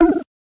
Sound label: Damage